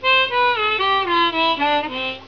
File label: scale down quick